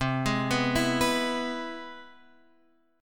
C Augmented Major 7th

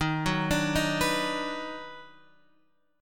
Eb Minor Major 7th Sharp 5th Eb down, chord; EbmM7#5; arpeggiated; JGuitar

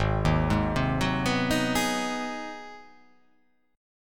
G sharp 7th Sharp 9th

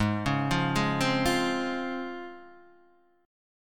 Db Major 7th Ab

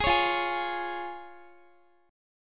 F Minor Sharp 5th F down Guitar; strummed; tuning 4E,4A,5D,5G,5C,6F

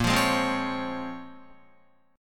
Bb Minor 9th Bb, Guitar; Bbm9; strummed; JGuitar

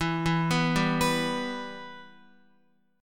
E-Minor, Guitar; arpeggiated; JGuitar

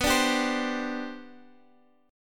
C Suspended 2nd, B, guitar chord; C2/B strummed

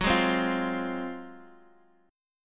F Minor F sharp, Fm/F# chord; strummed; JGuitar

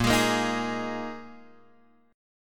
A sharp 7th Suspended 4th A sharp, Guitar Open G

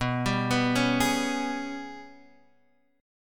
Ab Minor Major 11th B

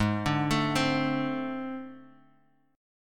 A Minor Major 7th Double Flat 5th Ab

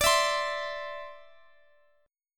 C-Major Add 9th D, guitar; Cadd9/D strummed

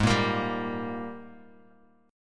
Ab Major 7th Suspended 2nd Ab down Bass Standard; strummed

